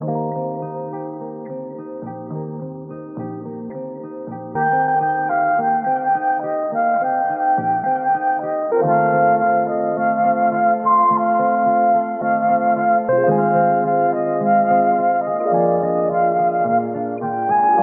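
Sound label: guitar and flute, Loops, Phonky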